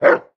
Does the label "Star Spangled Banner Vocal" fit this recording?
No